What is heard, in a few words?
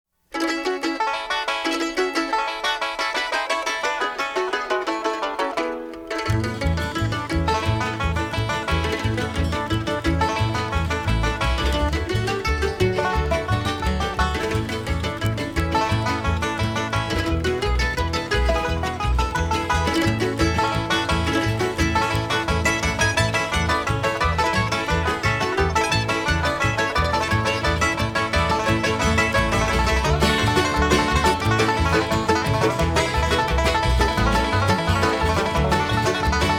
Banjos